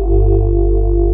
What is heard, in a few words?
WAV SWEEP G1; Big Fish Audio; Synth City